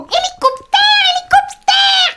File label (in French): Helicouptere